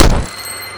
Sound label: cgun shot; DoomRTX